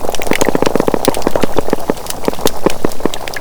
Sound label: catfish grunts